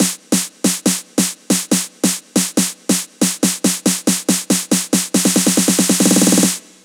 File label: Fills, Loops 140 BPM